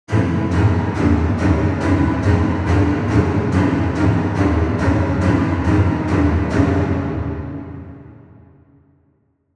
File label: Intense Strings Low, Horror Strings